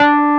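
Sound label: WURLY NO, Wurlitzer electric piano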